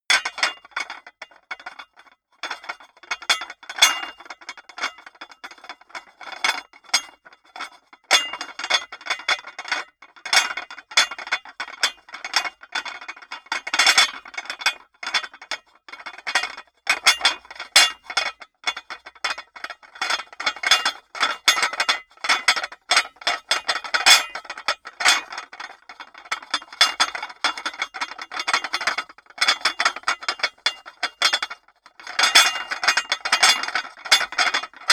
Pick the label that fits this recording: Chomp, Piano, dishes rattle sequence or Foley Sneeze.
dishes rattle sequence